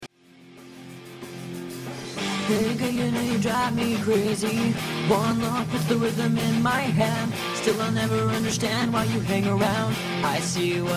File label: pitchcorrected